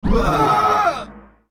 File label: Shouter Shout